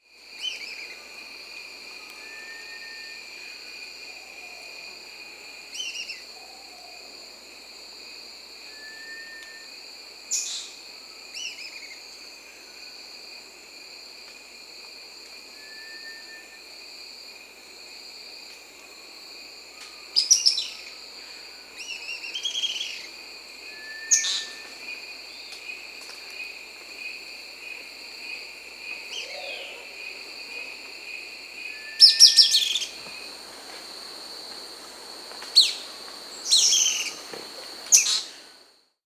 Round-tailed Manakin (Pipra chloromeros), Peru; S. America

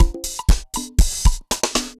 dancefloor perc 120 bpm; loops

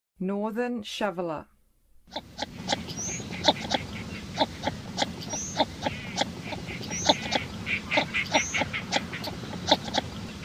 Northern Shoveler; North America

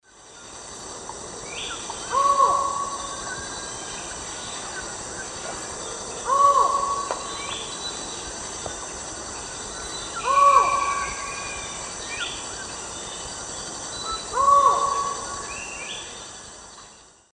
Micrastur semitorquatus, calls; S. America; Eastern Lowlands

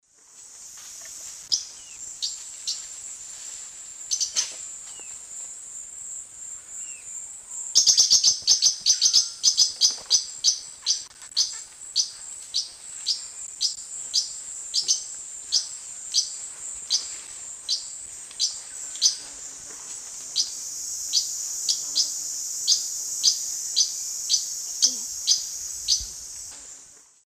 Myrmotherula hauxwelli, chip calls; S. America; Eastern Lowlands

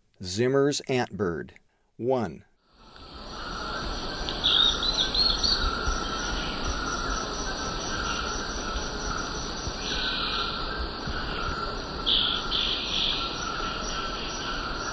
Zimmer's Antbird; S. America; Eastern Lowlands

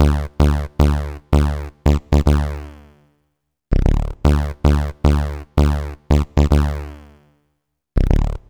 Bass, RnB

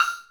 ESSENTIAL PERCUSSION

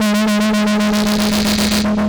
Hyperfuzz, Guitars; Rock

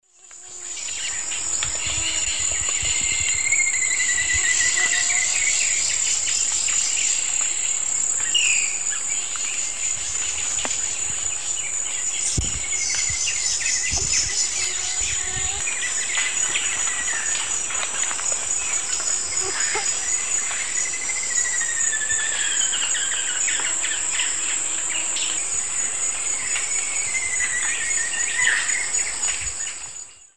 Xiphorhynchus ocellatus, song and call pair; S. America; Eastern Lowlands

